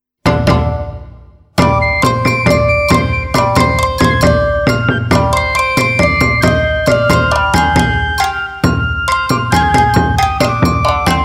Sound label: comical